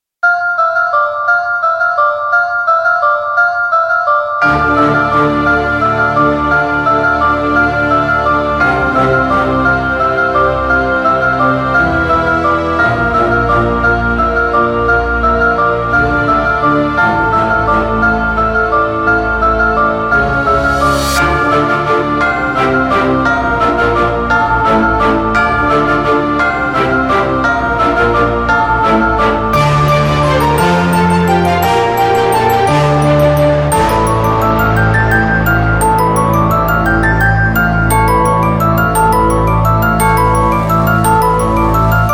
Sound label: Carol bells